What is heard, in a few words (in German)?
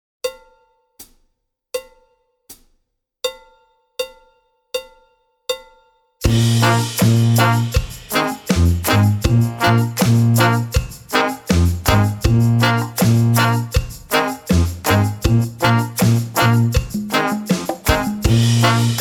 Reggae Begleitung